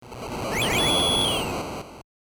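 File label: phone notification sounds in 8-bit style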